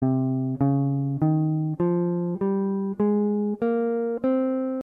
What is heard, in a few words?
phrygian